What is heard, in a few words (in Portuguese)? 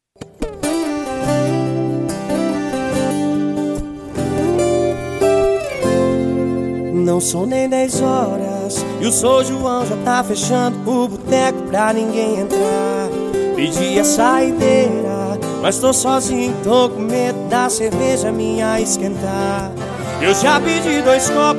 sertanejo universitário